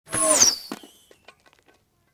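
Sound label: Whoosh Bow Arrow Shoot, Magical, Surreal, Science Fiction; Electa Quinney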